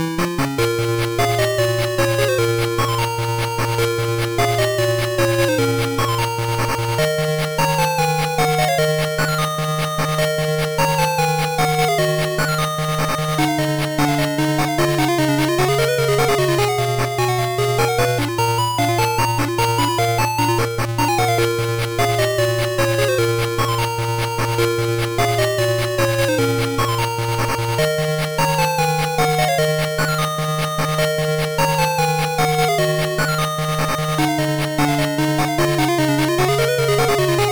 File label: Boss fight; Lord of the Sword; Master System